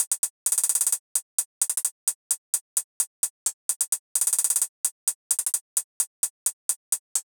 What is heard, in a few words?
Hihat Loop 130 BPM; Cymatics; Astro